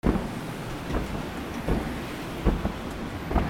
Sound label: DOORS